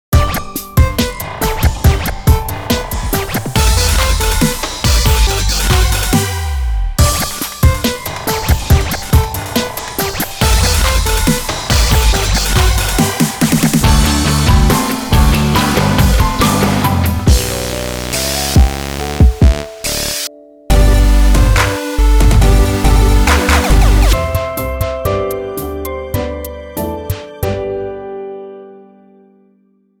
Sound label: CHIPTUNE